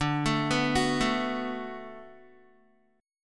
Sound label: D 6th D down Guitar, chord; D6 arpeggiated; tuning 4F,4A,5C,5G,5C,6E